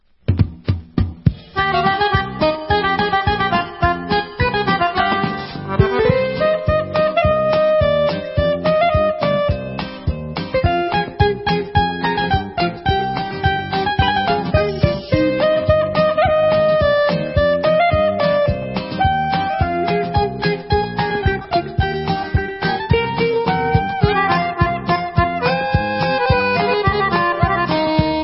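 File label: Instrumental, Israeli Dances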